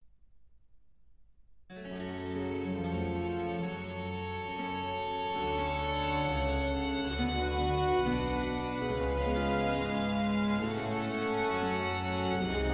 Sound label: Concerto for Strings and Continuo in G minor, Adagio; Vivaldi; 7 Concerti for woodwind and strings; The English Concert